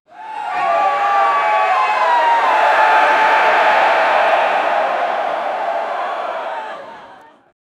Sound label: the loud cheer of a crowd after a victory